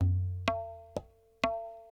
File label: PERC LOOP ETHNO 125